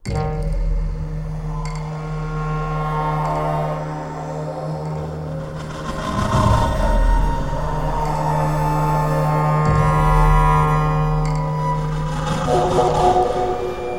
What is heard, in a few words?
Howls; Nancy Drew: The White Wolf of Icicle Creek